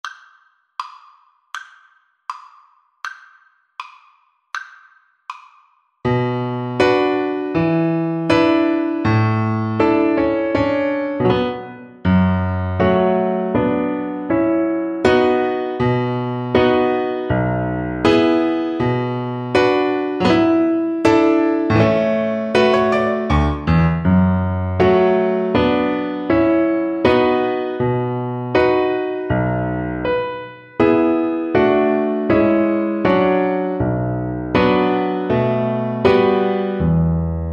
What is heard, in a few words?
romanian folkdance, cello